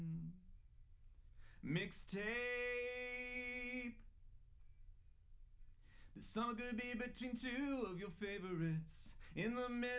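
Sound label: with drums live rec 5cm; SASSEC07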